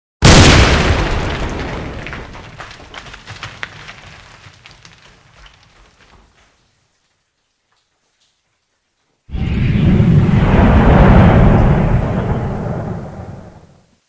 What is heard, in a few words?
Blast